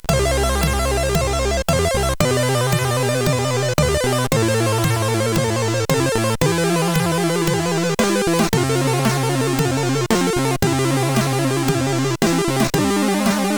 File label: Dutch Breeze Mix, CSG8580R5